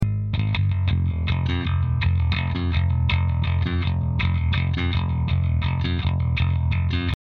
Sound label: rolling bass; note in a bass pattern thats lost in the mix